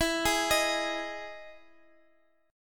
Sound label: E Major 7th E down Guitar Drop D